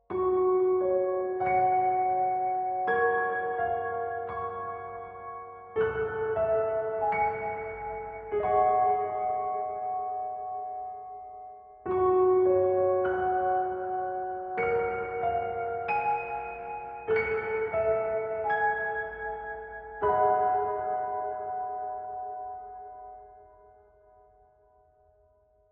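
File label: music piano; Undertale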